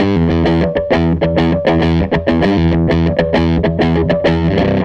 SG Guitar Loop

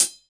HH, Drum Dry; East Collexion; Drum 1 Dry

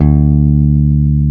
E.BASS

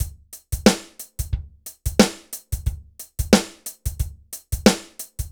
BPM 90 DRY

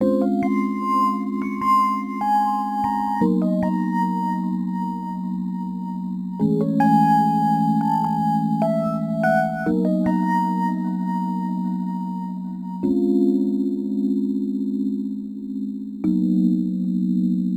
Rhodes Attacks; Ueberschall

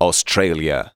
vox; Ueberschall; Techno Trance Essentials

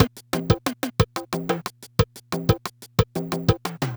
Loop, dd-5

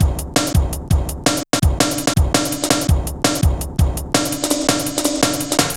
Bouncy, Jungle, Drum Loops 166.5; Zero-G Jungle Warfare 1, 2 & 3

